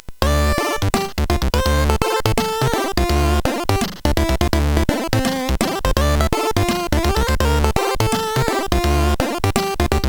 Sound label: Su-Sweet, CSG8580R5; hvsc